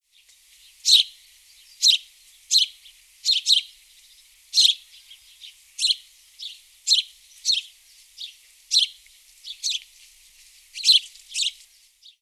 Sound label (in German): haussperling tschilpen